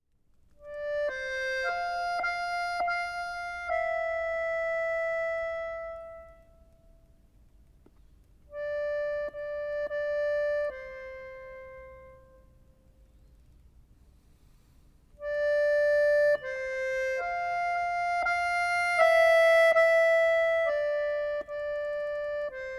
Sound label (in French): Petit orgue